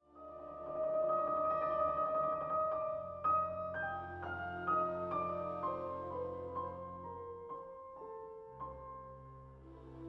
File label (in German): Lento Klavierkonzert